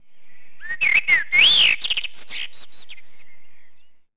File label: houses bird; Tony Hawk's Project 8; XBOX